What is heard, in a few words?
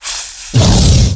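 Mob raid attack; Monster; 레이드; 사갈미희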